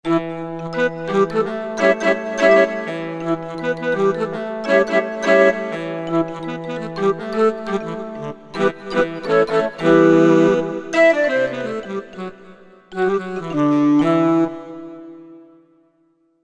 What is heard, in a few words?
Unisons, Korg MSC-11S Brass